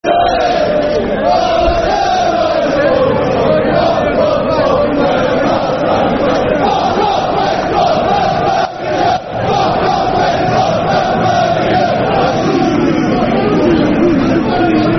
Seaside Football Chant - Aston Villa; Aston Villa Avfc

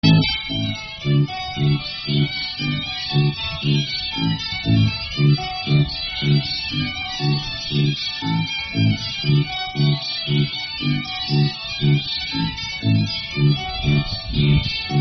Polka; Dumler; Old Dutch Polka

